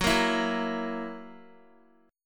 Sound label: Gb 7th Gb down Guitar, chord; Listen to Gb7 strummed